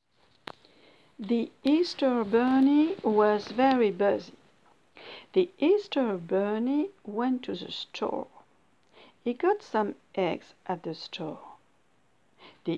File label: Easter Bunny vocal